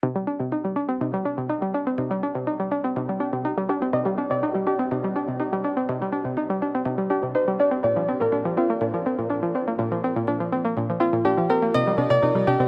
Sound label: Electronic Impulse